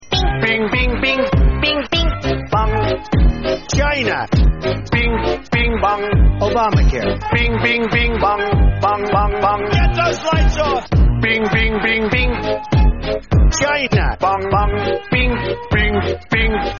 song

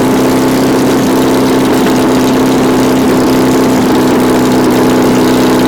v8 petrol large, engines; acf engines

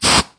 airburst

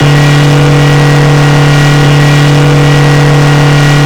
i4 special, engines; acf engines

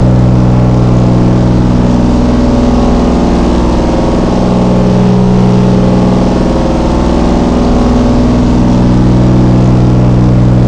b6 petrol large, engines; acf engines

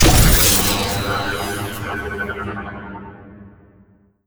player death; captainbigbutt; carbon miku

